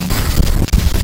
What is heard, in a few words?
teleport; Garry's Mod; huggy wuggy snpc; slender; corrupted woody